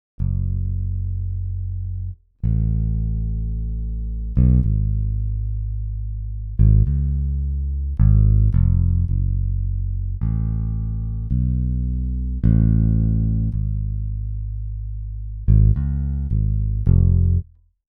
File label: Bass 808s Warm Before